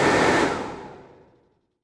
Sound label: Fan On Run Off; starbites